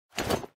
armor equip; minecraft

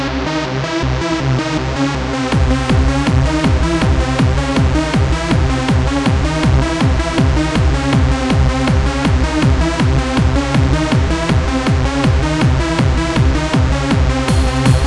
hardcore trance

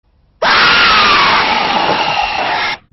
jumpscare sound; fnatz

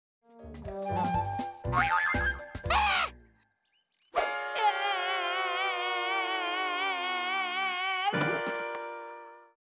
eeeeegggg

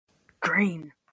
drain

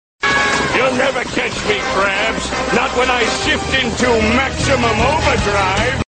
overdrive

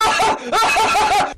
aha ahahaha

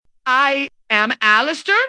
hmmmmmmmm

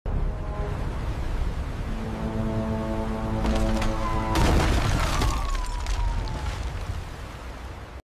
boat collision

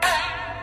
biwa; nakime

